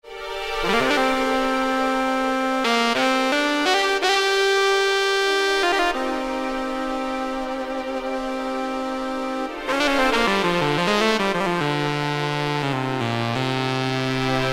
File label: Lovers Sax